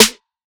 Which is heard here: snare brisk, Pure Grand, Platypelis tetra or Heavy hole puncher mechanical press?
snare brisk